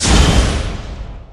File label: Explosion